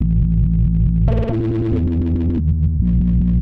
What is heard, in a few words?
TECHNO BASS 140 A